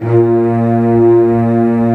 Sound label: Cellos; CMI